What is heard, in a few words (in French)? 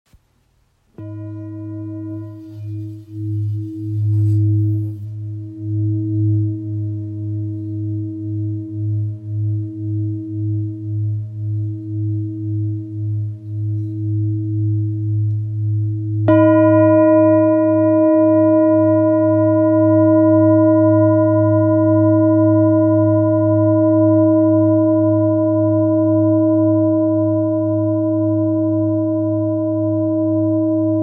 Bol bengale sol diese